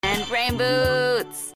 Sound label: rain boots